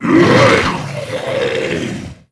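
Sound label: attack; sinfar haks; sf effects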